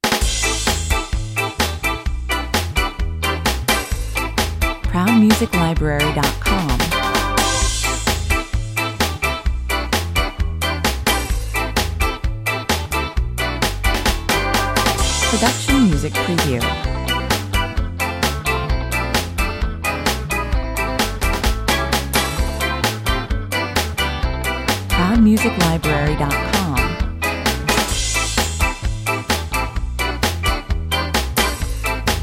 a minor ska no horns 130 bpm

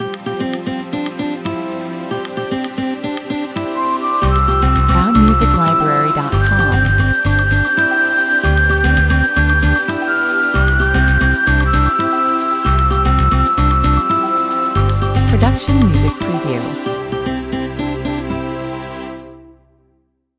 documentary 114 bpm

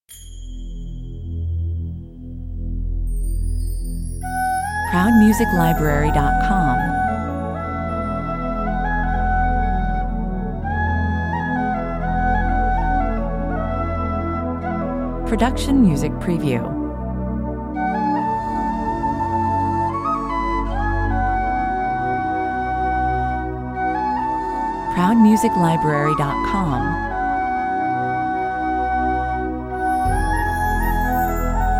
celtic dream 125 bpm

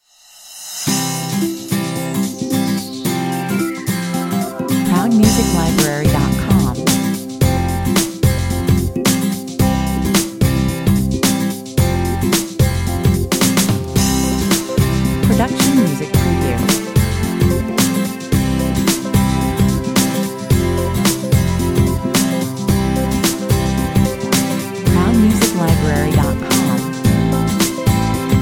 the success effect 110 bpm

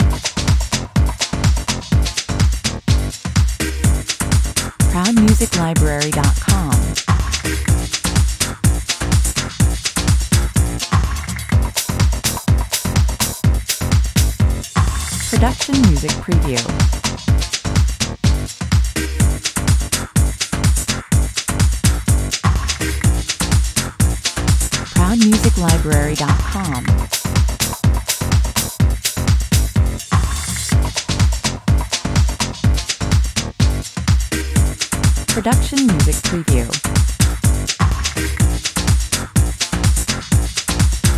club house beats 125 bpm

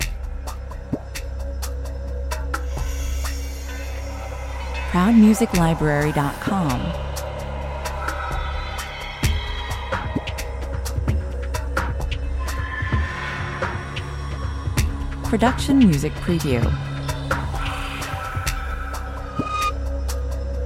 creepy fluid 87 bpm

